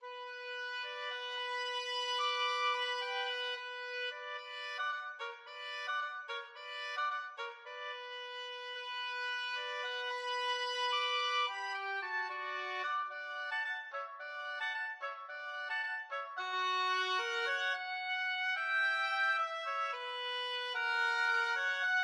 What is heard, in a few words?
oboe duet